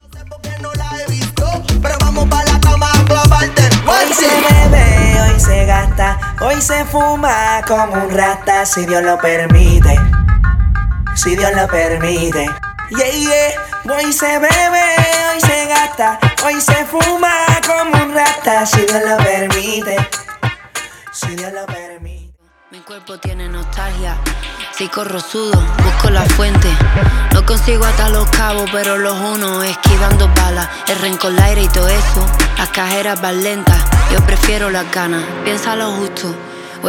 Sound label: Latin Beat